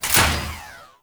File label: Ship Fighter Platform Stop; Warframe; Corpus; Ship Remaster